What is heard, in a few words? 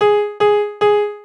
calldrop, phonetones